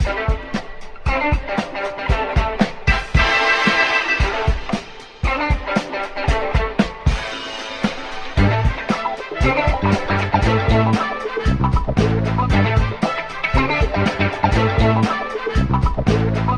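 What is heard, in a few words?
full of guitar and happy horns, Loops Phonky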